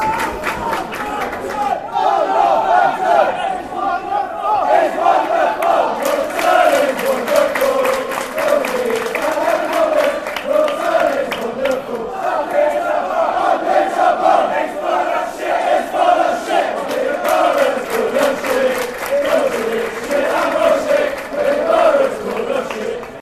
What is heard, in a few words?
oh northampton